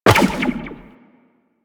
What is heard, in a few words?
Step Zipline, Footsteps; Walk; Warframe; Tenno